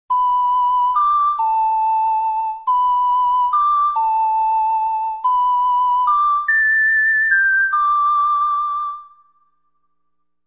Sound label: ocarina lullaby